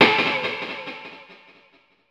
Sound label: Citizens; murasaki baby; ps vita